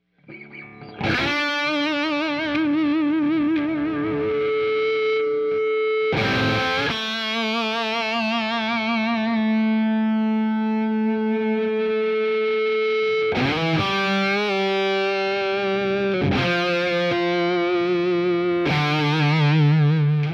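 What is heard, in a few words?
H540 bridge feedback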